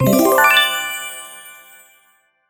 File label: Donation Received; HappyDays